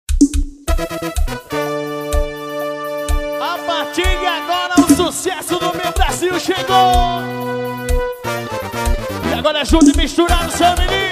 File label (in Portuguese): ritmos faixa